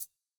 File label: hihat; Reference Beat BPM120; j city pop 1980